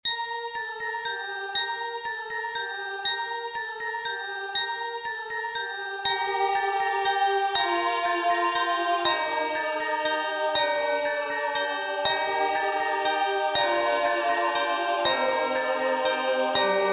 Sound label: for handbells handchimes choir satb; leontovich mykola; ring christmas bells